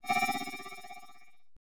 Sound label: conv Paused Othr